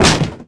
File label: door slam down; Tony Hawk's Project 8; XBOX